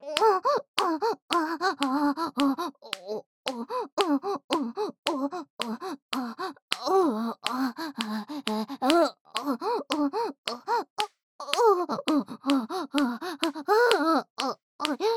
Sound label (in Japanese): オホ喘ぎ; おまけループ（オナサポ）; 喘ぎ声; SE有